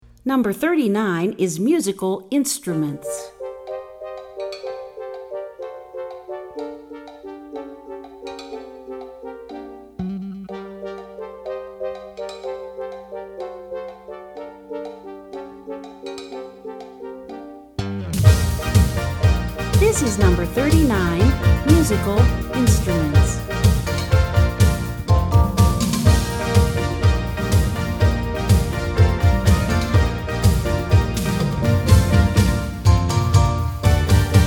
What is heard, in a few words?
Musical Instruments Sonata In D Major